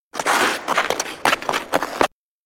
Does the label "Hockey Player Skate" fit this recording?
Yes